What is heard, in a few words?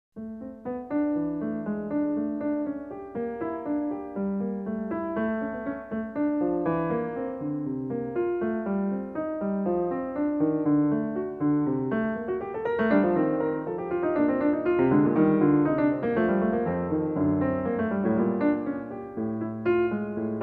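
prelude G minor, classical keyboard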